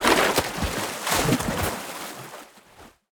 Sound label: Sloshing Water